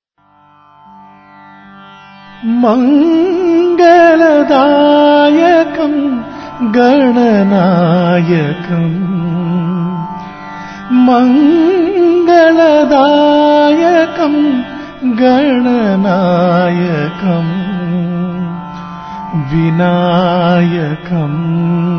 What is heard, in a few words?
Bhajan; MangalaDayakam